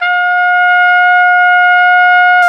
BRS, Tpt p; Roland L-CD702

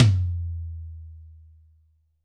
AMBTOM, DRY+AMB TOM

